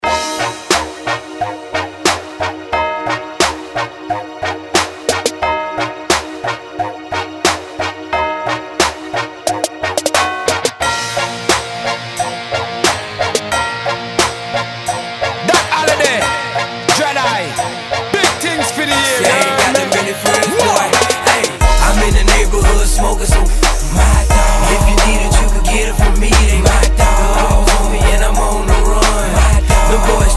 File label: rap